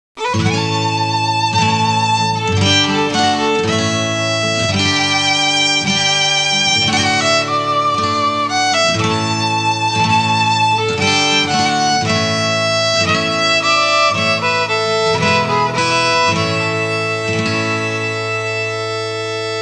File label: suonerie musica traditional usa country; american